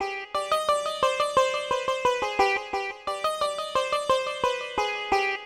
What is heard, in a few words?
Sitar; Hip Hop Exotica; Apple Loops; Big Fish Audio